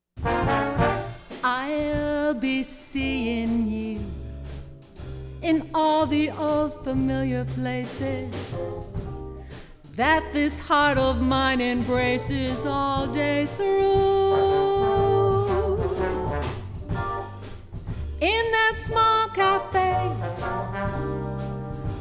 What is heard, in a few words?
Jazz Combo Vocal; Premier